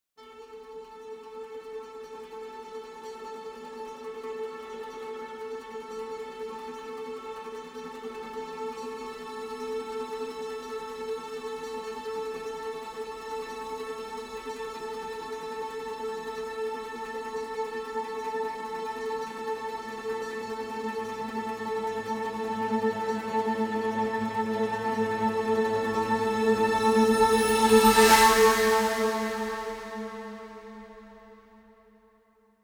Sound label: Suspended Trems (A); Brand X Music; Brand X Music Catalogue - Editor's Essentials